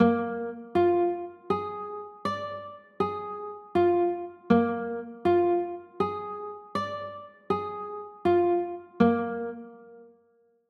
a sharp 6 9, chords; a sharp 7

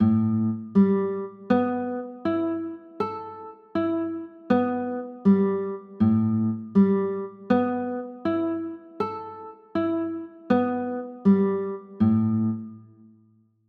a 6, chords; a 9

